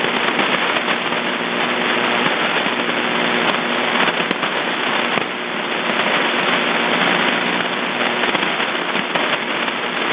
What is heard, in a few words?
3.2 MHz (AM); AI5EZ DM75; Ricochet